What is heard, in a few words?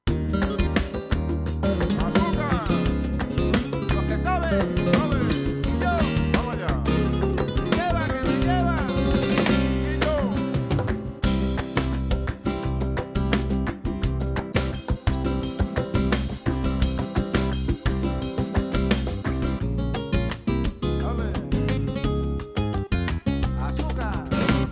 yamaha tyros 5 styles tango flamencos